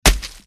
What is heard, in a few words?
impact blunt